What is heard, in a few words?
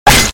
hit